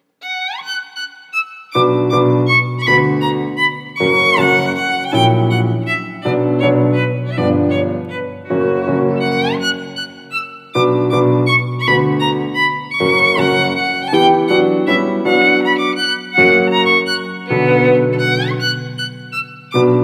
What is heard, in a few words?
Figure Skate La Campanella; Guruguru Tamagotchi; 3DS